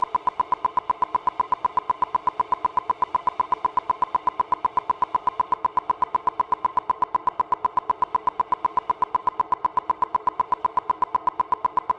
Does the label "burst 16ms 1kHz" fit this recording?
Yes